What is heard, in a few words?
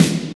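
snare drum; E-Lab; X Static Goldmine 1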